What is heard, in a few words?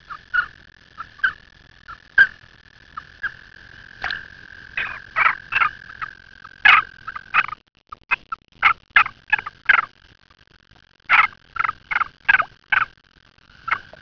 bufo americanus release